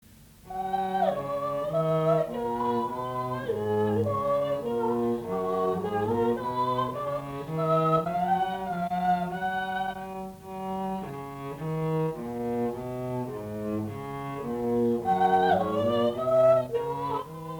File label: Hallelujah, Hallelujah, Amen, Amen, Amen